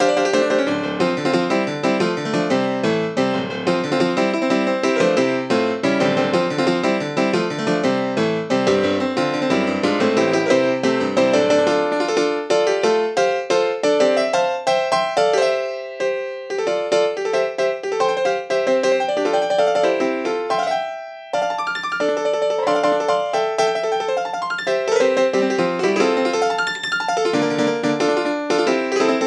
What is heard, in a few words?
Piano solo; Inubashiri